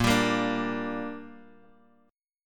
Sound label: Bb 6th Bb, Guitar Drop D